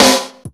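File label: 175 UK snare drum, Ragga & Dub Reggae; USB Soundscan vol.27; AKAI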